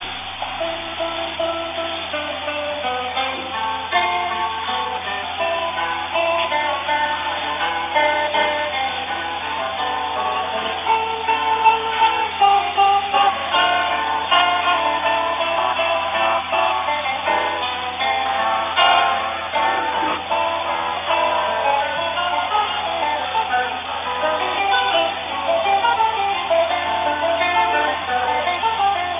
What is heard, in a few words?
fuga, guitar